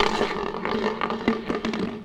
Roll Popcorn Bucket; SFX Kit; Foley - Misc

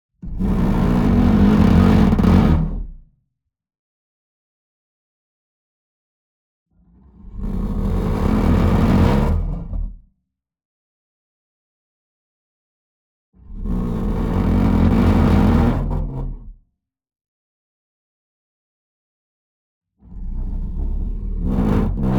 Sweetener Lion Roar Near; boom library; Cinematic Strikes Construction Kit

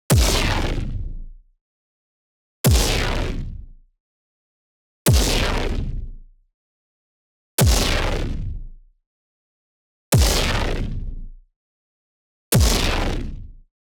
EXPLOSION SHORT Punchy Thud; boom library; Anime Essentials

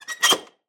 Semi Truck Garage; Large Vehicles; Blastwave

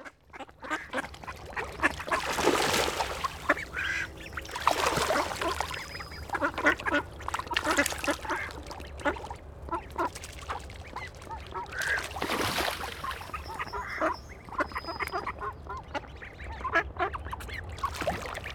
ducks feeding; best service Blue Box

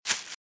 Velociraptor - Footstep - Grass - Run - Left

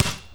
Street Ball Slap Ball Block; SFX Kit

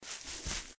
Green Weeds; Blind Quest - The Frost Demon

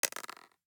DICE on Plastic, Throw and Roll, Mini, 2 Two Dice; Samples; ArticulatedSounds; DICE Sfx Party (Extended)

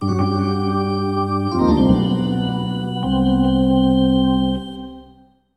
Hockey Organ

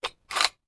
Machine Gun Foley; Sound Ideas; Guns

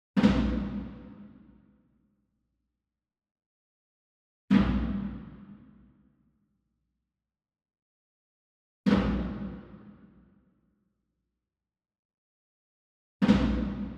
Small Drum Tabla Flam Hard Beater Far; boom library; Cinematic Strikes Construction Kit

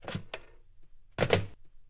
Phone Pick Up Hang Up; Home & Office; 1001 Sound Effects

